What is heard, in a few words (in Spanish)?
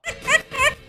risa